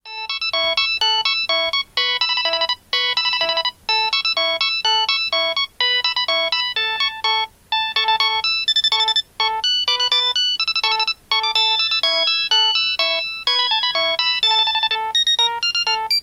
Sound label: ringtone; 16 和音